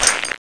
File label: player snow